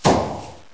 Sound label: Firecracker